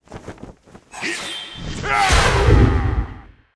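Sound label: ghost captain attack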